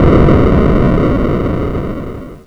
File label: purge sustained boom; gae wild jam